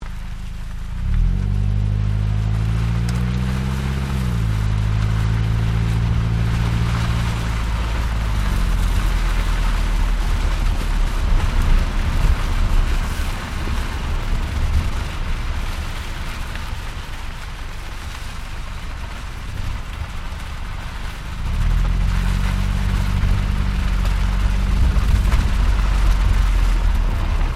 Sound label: CAR TOYOTA COROLLA DRIVE SLOW EXHAUST GRAVEL LOOP STEREO, hatchback